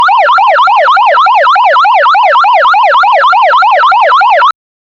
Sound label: retro sci fi emergency si, non-melodic